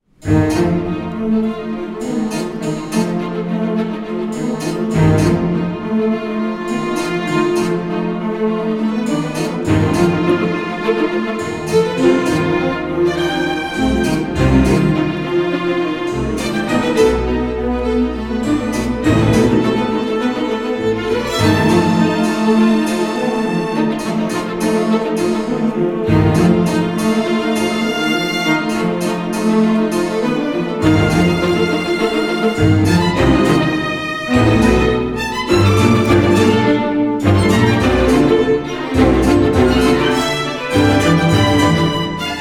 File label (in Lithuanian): Koncertas obojui, klavesinui ir styginiams